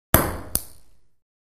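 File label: Ping Pong Single Ball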